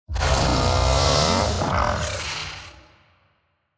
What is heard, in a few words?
kaiju hatchling death; biogenesis